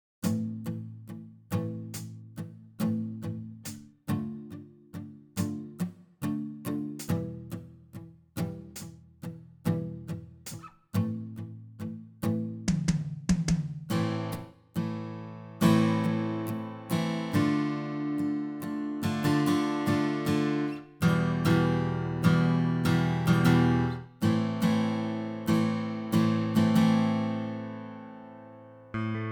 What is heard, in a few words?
Phaser